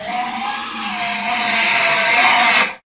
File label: boar; kult